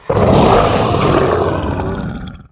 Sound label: Ornery Direhorn Death